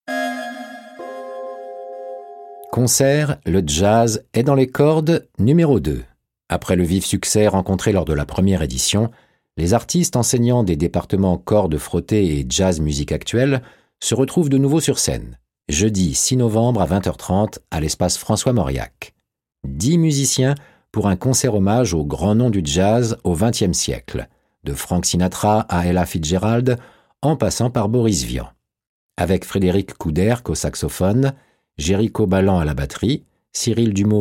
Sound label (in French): concert le jazz est dans les cordes; talence